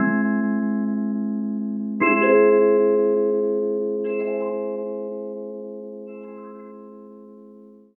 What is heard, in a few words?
Chyme; Sonic Foundry (Sony Creative Software) - Future Retro Dance Excursions